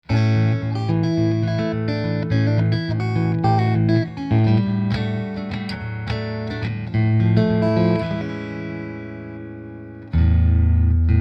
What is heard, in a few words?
BASSMAN CLEAN